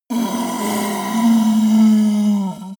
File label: yeti groan long dying; kreaturen